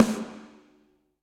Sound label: Snare Hit & Rim Dark Plate Verb; Drum Hits; Ableton; Factory Packs; Session Drums Club; MPE Hits